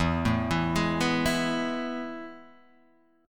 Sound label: A Major 7th Suspended 2nd E